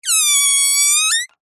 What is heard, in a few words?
foley balloon deflate squeezed valve squeak high pitched; zapsplat